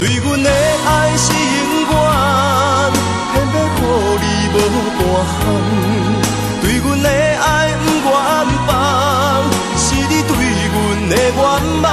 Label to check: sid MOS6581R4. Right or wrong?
Wrong